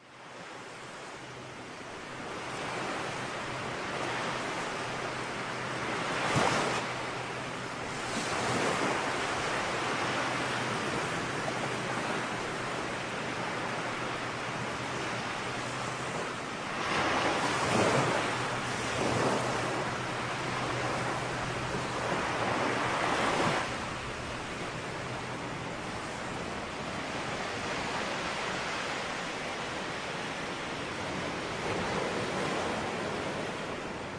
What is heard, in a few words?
Relaxing Sounds of Waves - Ocean Sounds, Tropical Beaches